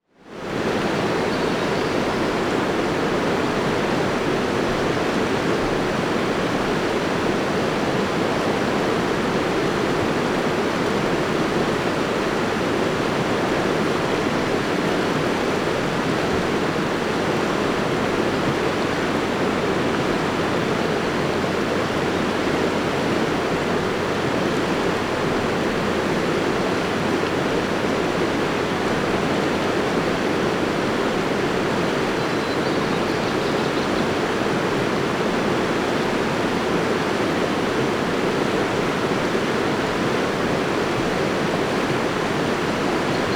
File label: WATER RIVER, River Ambience